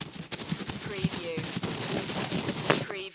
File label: CREATURE SCAMPER